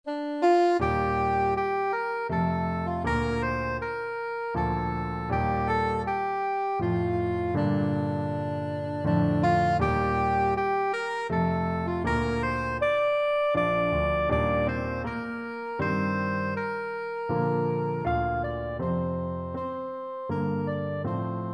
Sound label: jupiter, soprano saxophone; holst